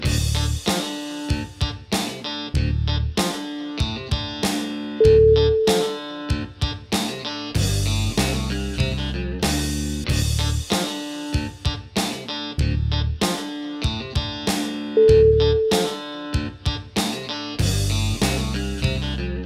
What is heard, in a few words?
swampy southern funk